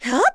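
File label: Vox Attack, Korean; Laudia; kingsraid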